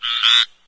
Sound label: small frog, random animals, frog groups; ambient; Left 4 Dead 2; Source Engine